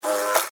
Hornet Land Short, Animal, Hornet, Land, Short, Fly, Wings, Buzz, Insect, Bug; Blast Wave